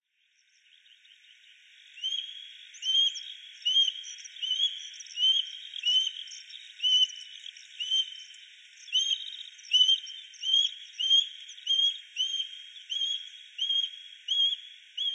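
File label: Osprey; misago; hyogo; koe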